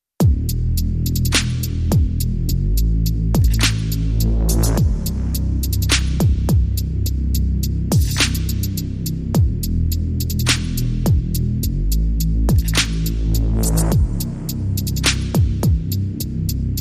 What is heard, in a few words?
Drums and Bass